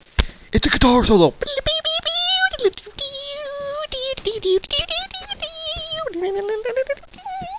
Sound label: Guitar Solo